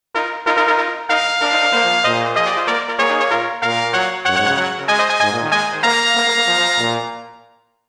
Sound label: Once and For All! Eager Fanfare; Monster Farm 2